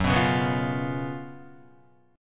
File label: Gb Major 7th Suspended 4th Gb, Guitar; strummed; 1,2,1,4,1,1; 4F,4A,5C,5G,5C,6E; JGuitar